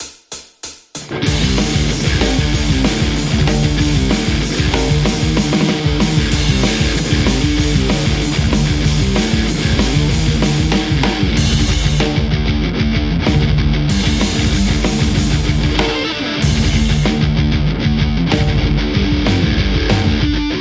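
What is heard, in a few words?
metal Stratocaster; overloud; th2; guitarheromod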